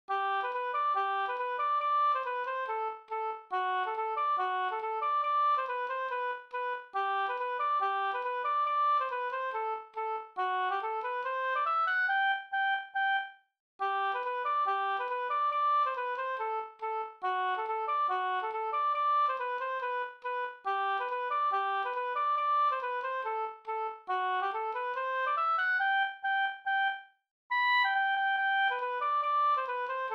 schottis; e Oskar Eriksson pa Sve Jm

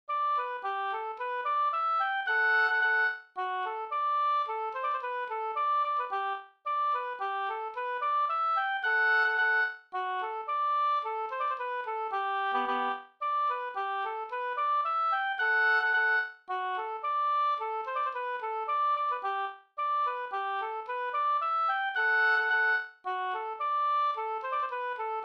vals

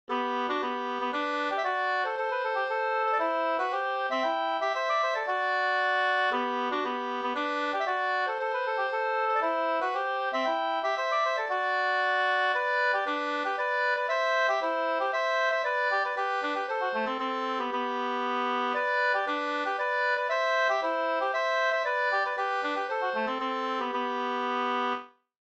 polska Dur

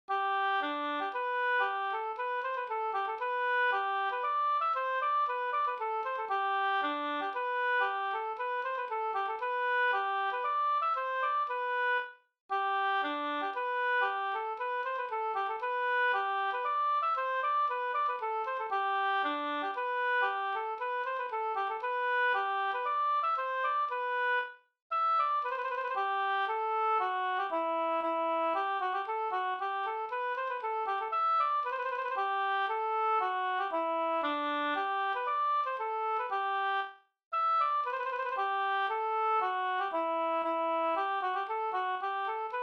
polska, Gdur

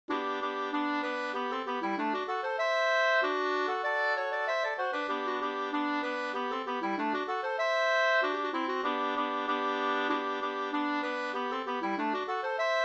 folkdans; Lybeckare Bh 2st

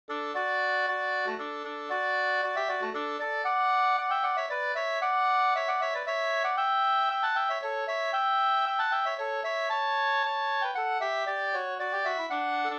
polska Bodapolska

